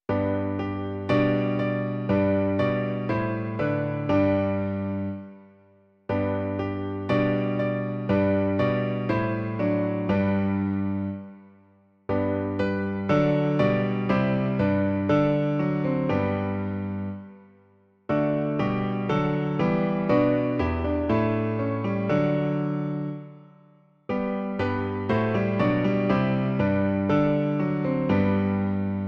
klavír; Bože, jenž jsi Krista nám dal; Klavírní verze

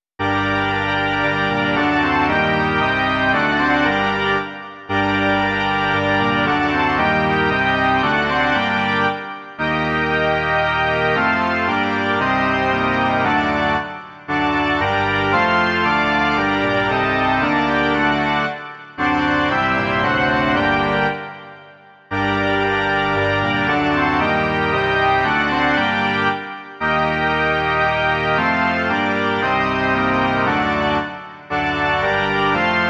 varhany; Pojďte, bratří, pojďte spolu

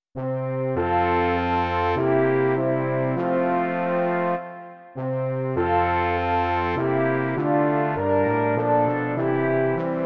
2 lesní rohy, trombón a tuba; Imaginativní verze

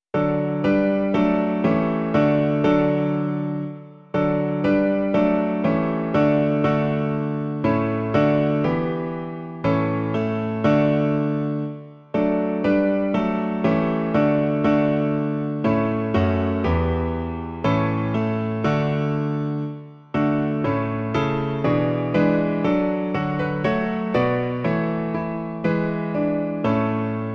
Rok starý v moře věkův - klavír; Klavírní verze

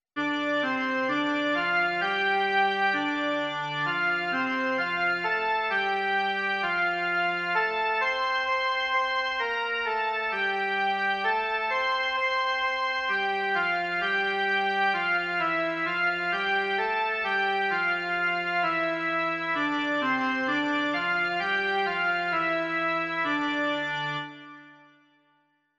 jen melodie; Ó náš milý Bože

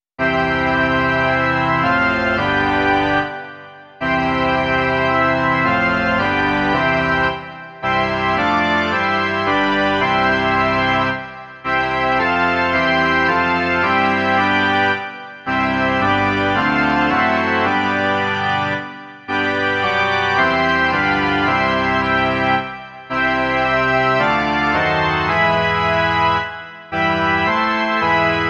varhany

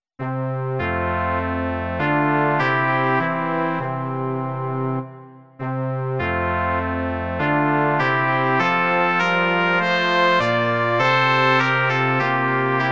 žesťový kvartet; My k Tobě jdeme, Ježíši; Imaginativní verze

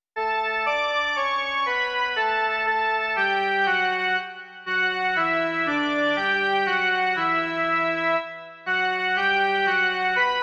jen melodie; Ó Ježíši, ty v onu noc

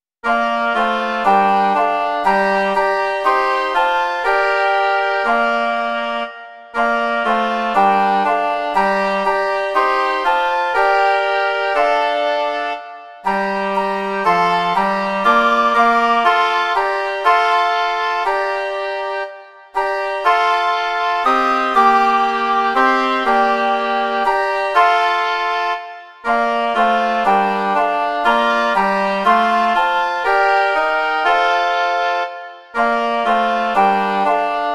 2 flétny a 2 hoboje; Pospěš, pospěš; Imaginativní verze

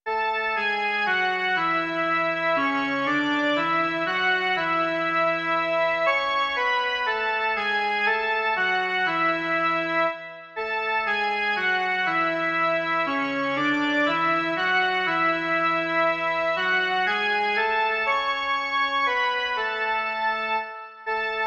jen melodie; Po světě bloudí lidský syn

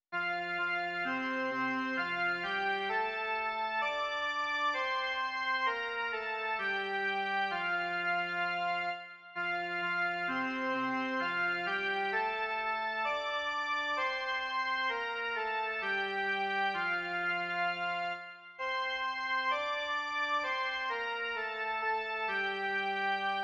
Král věčný nás požehnej jen melodie

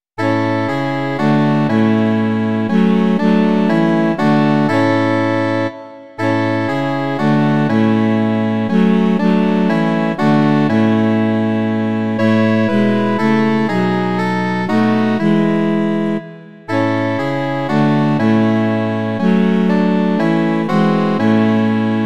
saxofonové kvarteto; Po světě bloudí lidský syn; Imaginativní verze

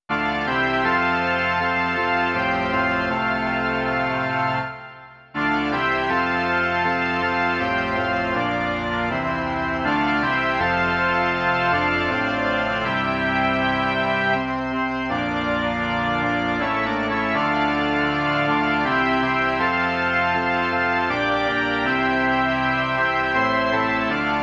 varhany

